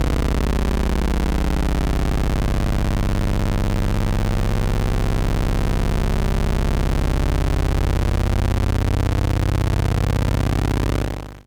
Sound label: Sid C0; R-Type